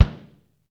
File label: DRM JAZZ, Brushes; Roland L-CDX-01